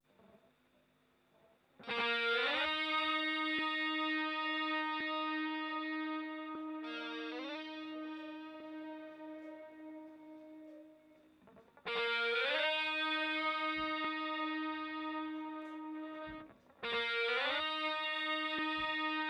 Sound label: Guitar Intro